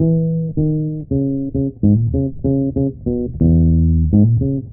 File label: bass, Loops Phonky